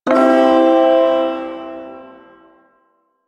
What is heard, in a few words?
Ersatz Shawzin Phrygian Chord; Warframe; Duviri Ersatz Shawzin